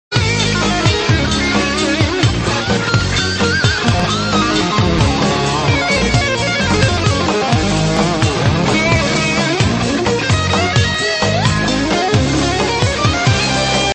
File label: guitar solo ringtone